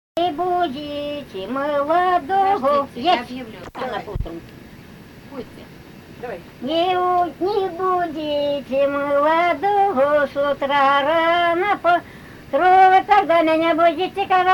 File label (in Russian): Не будите молоду, Свадебные песни; Псковской области; Объекты нематериального культурного наследия Псковской области